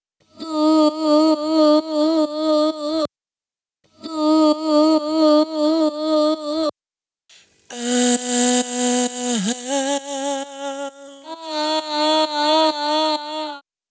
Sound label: 132BPM Vocal DRY; Bassline & Melody; G#major; Vengeance Samples; Jericho Kit